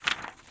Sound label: Page turn; Phasmophobia